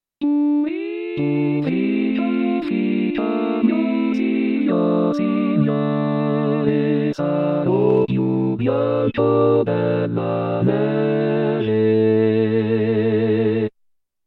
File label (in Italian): coro